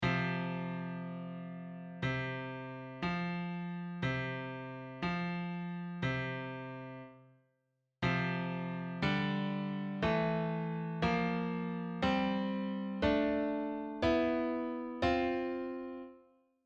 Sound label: perfect fourth